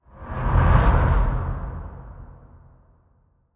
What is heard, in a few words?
Cinematic Transition; soundbits